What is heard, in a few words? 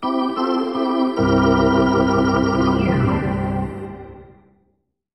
Hockey Organ